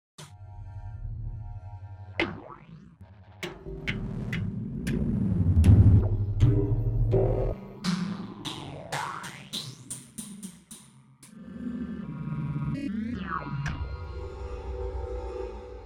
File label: KINETIC METAL